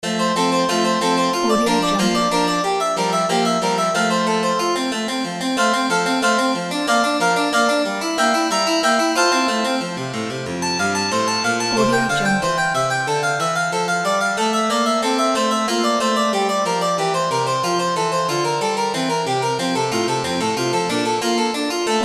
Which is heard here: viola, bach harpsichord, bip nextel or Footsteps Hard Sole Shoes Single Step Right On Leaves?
bach harpsichord